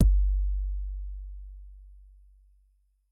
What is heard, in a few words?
Kick, Yamaha RY20